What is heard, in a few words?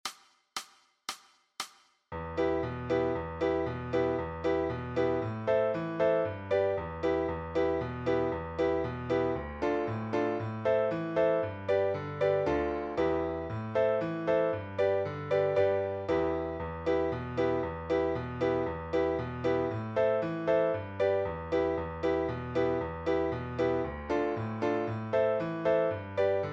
Backing Track